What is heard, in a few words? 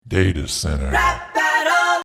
Stinger